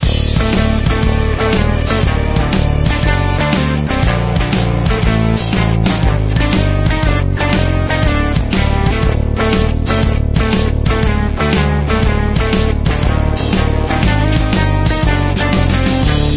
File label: Bgmusic Halloween; Hill Climb Racing